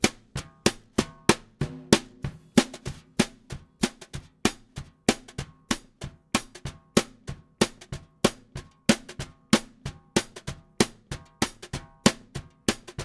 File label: Drum Snare